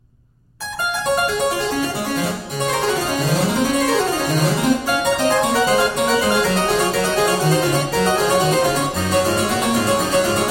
Partin a Cembalo Solo Fantasia furs Clavier Vivace, Harpsichord Solos; Telemann; Der Getreue Music Meister; Georg Philip Telemann